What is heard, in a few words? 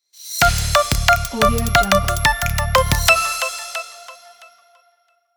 short technology logo